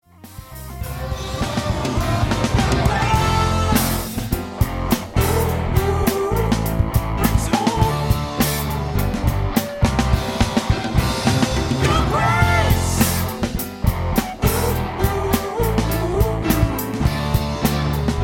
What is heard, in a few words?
Instrumental